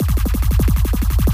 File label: pulse drive